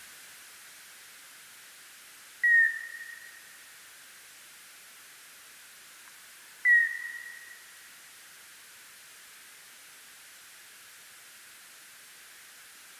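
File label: Nyctalus noctula, bat; Boehm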